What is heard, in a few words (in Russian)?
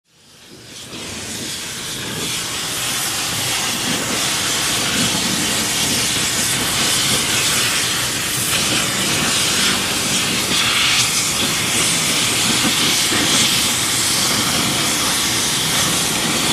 obychnaja svarka gazom